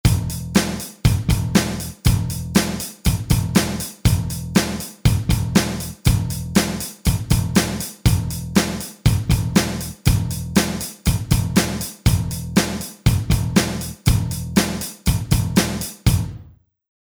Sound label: Rock